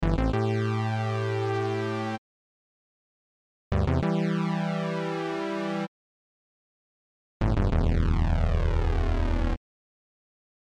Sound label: calming start